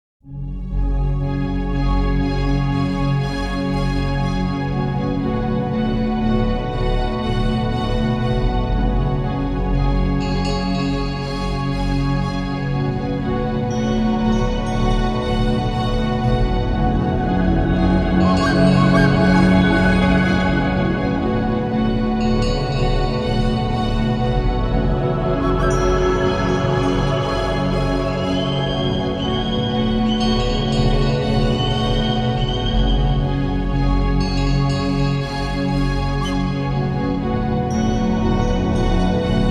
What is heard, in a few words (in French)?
la grotte en toi keyboards